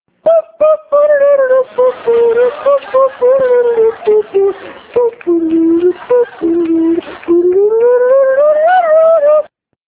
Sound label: ring tone